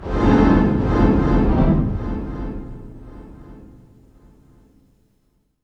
STR HIT, String FX; Roland - LA Composer vol. 2

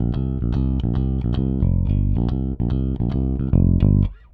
Rock, Bass; Bass X

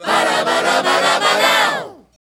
Lik, Vocal Lix

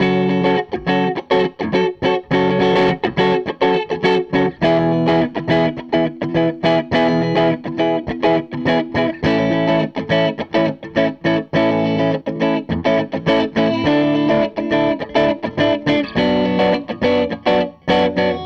Gretsch Guitar Loop

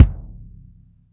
BD, Bassdrums, Roland TD-20

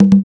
African Perc; Spectrasonics; Supreme Beats; African Contemporary; SB Afro DRMs

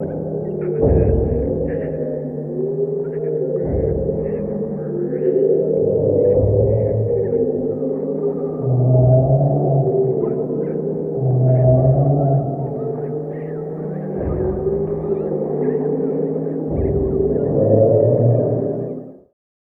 Fairground; Zero-G - Ghost In The Machine

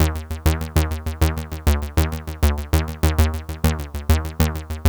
XPANDER; AMG - Vince Clarke Lucky Bastard

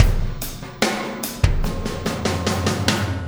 Impact Crater Beat, Processed Drumkits

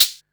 hat, Techno 138 BPM

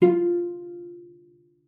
F4, CELLO PIZZ; 90 s Sample CDs; Xsample VOL-5

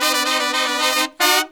Pop & Funk Brass, 110; Best Service ProSamples vol.25; AKAI

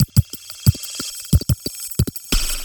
FAST SHIMMER LOOP

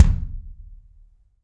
BD AMBIENT, Drums; Real Mega Drums

